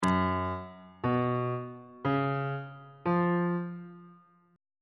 g flat major 7th suspended 4th chord intervals on bass clef